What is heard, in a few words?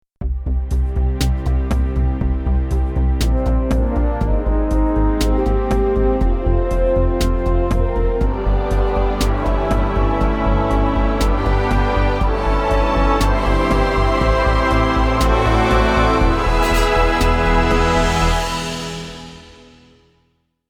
beautiful way to end